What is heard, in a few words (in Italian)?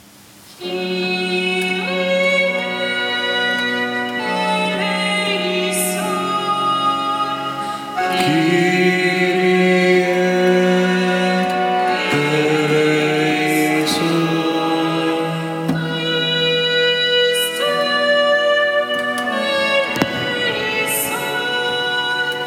Kyrie CONTRALTI; Lourdes 2019